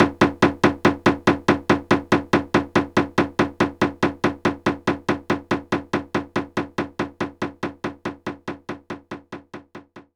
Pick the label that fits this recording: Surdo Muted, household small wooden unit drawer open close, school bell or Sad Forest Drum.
Surdo Muted